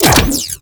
Briefcase Launcher Mech; Warframe; Weapons; Corpus; Crp Briefcase Launcher

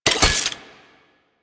Flame Spear Reload; Grineer

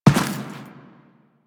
footstep dirt; Step Dirt, Footsteps; Warframe; Teshin

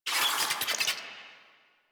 Infested Ashen Vocal Pain; Warframe

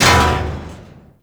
Mech Head Impact; Nechro Mech; Vehicles

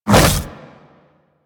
Melee Swipe Small; Enemies; Warframe; Infested; Grey Strain; Splitter

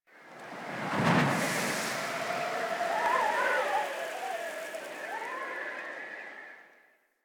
Landscape Wind Gust High, Ambience; Warframe; Venus Open World; One Offs

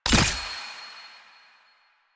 UI Mod Rank Up Selection B; DE; Warframe; Mods

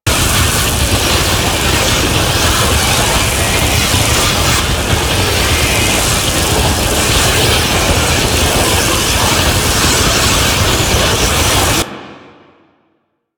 Tengu tornado loop; Warframe

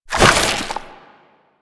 Inf Arm Cannon Idle B; Warframe; Weapons; Infested

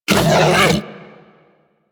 Predasite Vocal Pain; Warframe; Hunting Critters

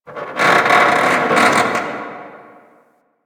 Metal Creak, ambience; One Offs; Warframe; Quests; The New War; Drifter Camp

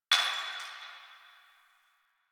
Distant Glass Breaking; Warframe; Ambience; Nineteen Ninety Nine

